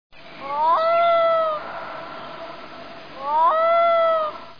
gavia arctica, water birds

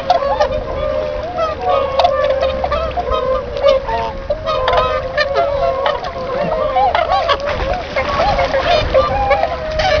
cygnus bewickii

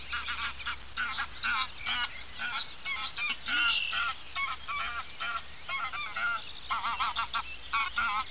anser anser

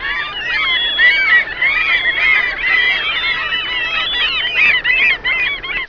larus canus

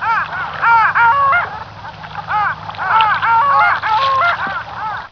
clangula hyemalis

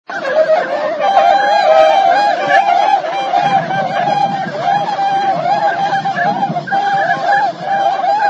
cygnus bewickii

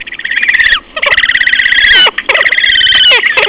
podiceps auritus

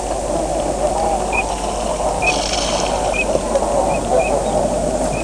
anas crecca

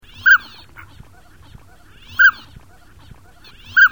anas acuta

